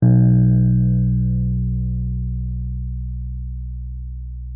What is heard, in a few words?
Wurlitzer B1; Xsample VOL-1; 90 s Sample CDs